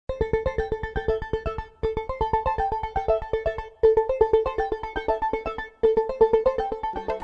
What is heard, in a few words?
science jingle